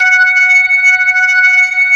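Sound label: B3 low distortion slow, ToneWheel Organ; E-MU Formula 4000 Series Vol. 3 – Analog Odyssey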